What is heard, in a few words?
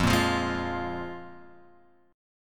F Minor Double Flat 5th, guitar chord; Fmbb5; {1 1 3 1 X 1} strummed